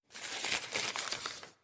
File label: Spell Golthor Flip; Legend of Keepers (gamerip) (2019)